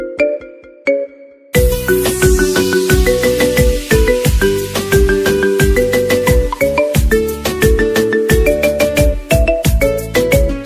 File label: Ringtone Only Music